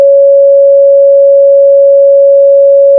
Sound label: pop, sound of a bike bell